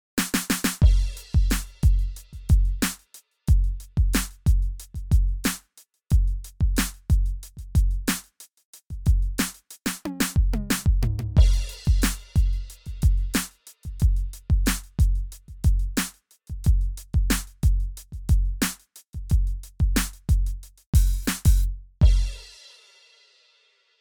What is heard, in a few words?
Roland TD-17K-L test 909